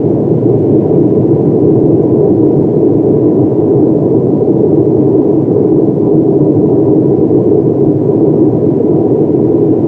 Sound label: shellshock loop; Call of Duty Modern Warfare